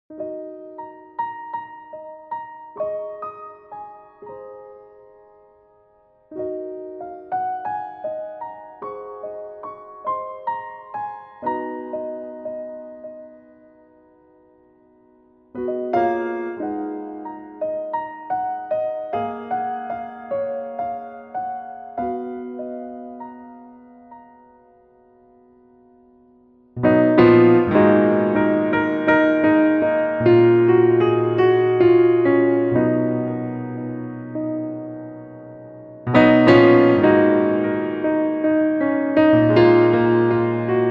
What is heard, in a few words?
piano